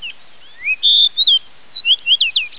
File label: Fox Spa song; bird